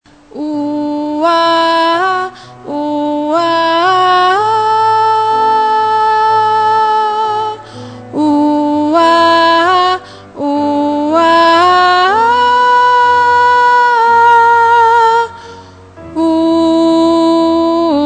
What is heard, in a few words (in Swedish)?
vi har bara varandra sopran, stämmor